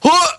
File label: huuuh